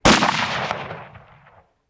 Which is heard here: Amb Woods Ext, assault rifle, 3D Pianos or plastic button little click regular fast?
assault rifle